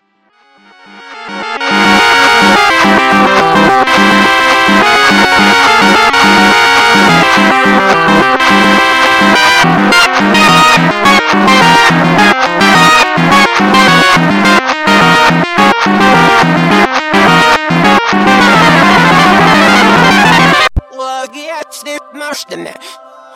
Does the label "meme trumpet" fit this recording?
Yes